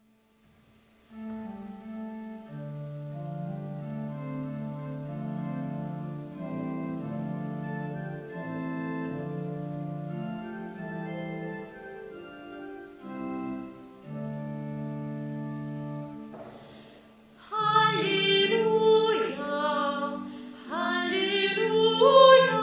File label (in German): halleluja